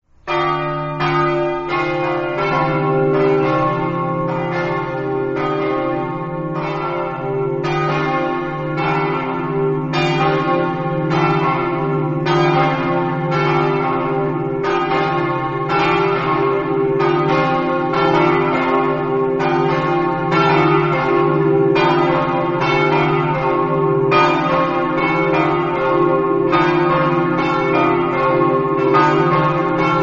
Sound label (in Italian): campane